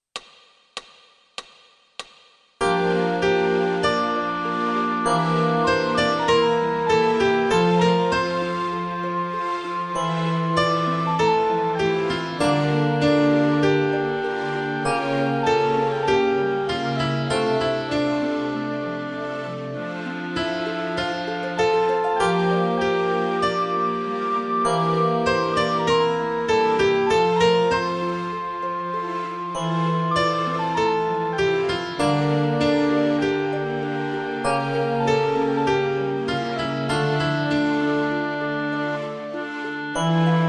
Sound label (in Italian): Ascolto piano tutti; Danza-Polovesiana